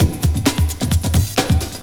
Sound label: LOOP; SoundFactory; DRUMLOOP